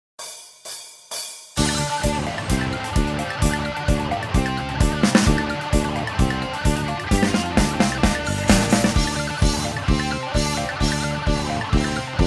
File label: instrumental